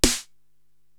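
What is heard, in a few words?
Snare Drum, Drum Machines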